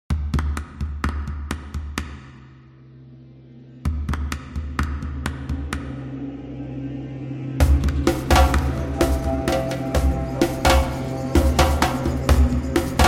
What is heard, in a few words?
African Drums